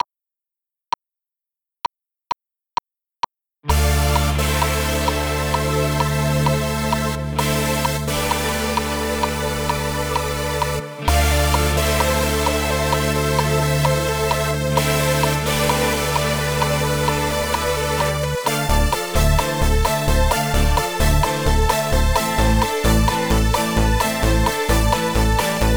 Post Disco Keys

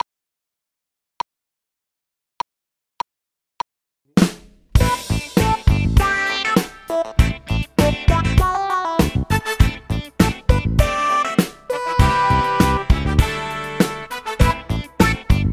KB Drums

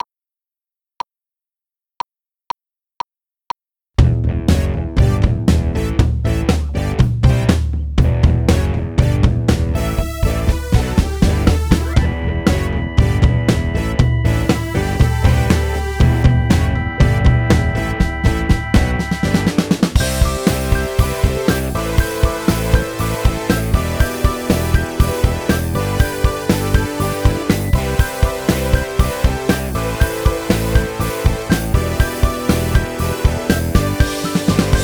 Test Run Keys Version

